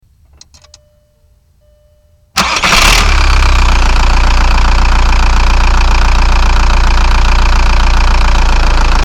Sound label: TDI start; VW